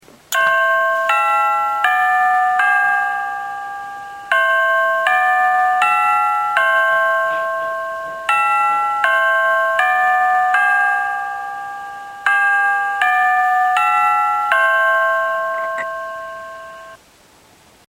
westminster, coucou

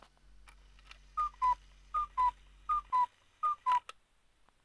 coucou; loetscher

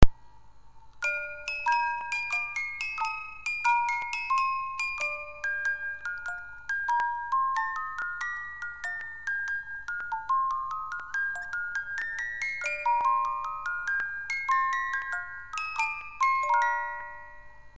barcarole, boite musique

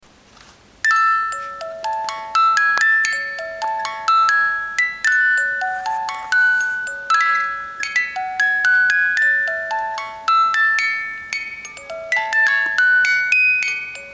ave maria 18 lames, boite musique

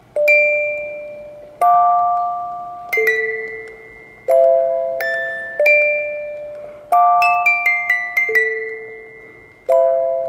18 lames, Boîte à musique en bois

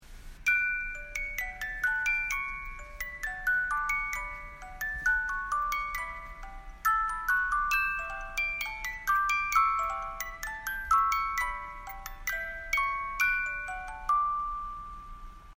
18 lames, boite musique

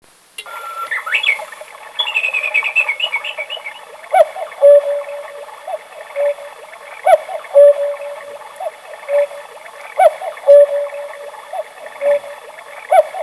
quartz, coucou

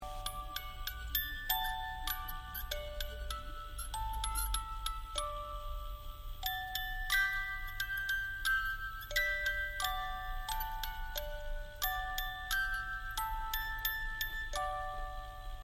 boite musique

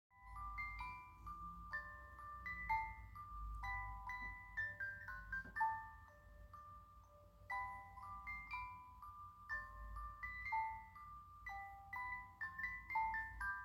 schubert lullaby 18 lames, boite musique

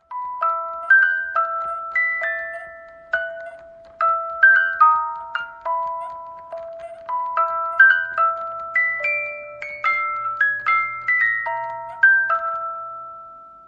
18 lames, boite musique manivelles

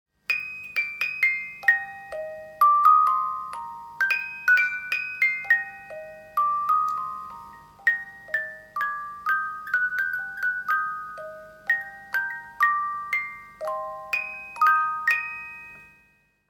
18 lames, boite musique manivelles

